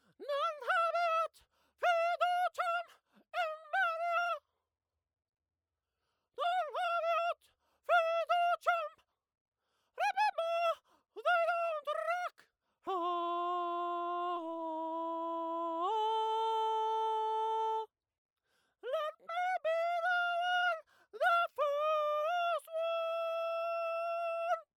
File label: Vox Choir; Hoog